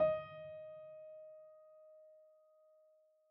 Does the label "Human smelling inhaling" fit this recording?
No